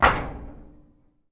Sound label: sprint metal; Escape from Tarkov